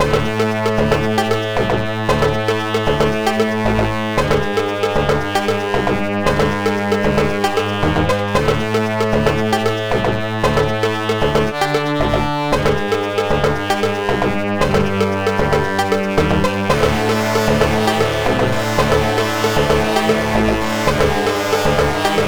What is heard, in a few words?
loop, music